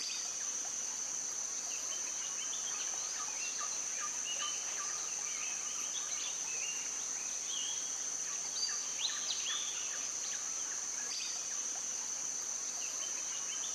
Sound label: birdsong